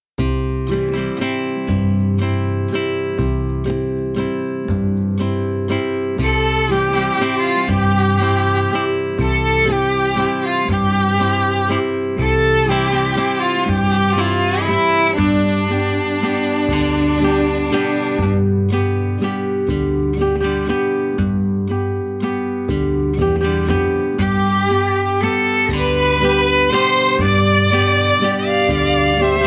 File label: Mexican Waltz (Quartet)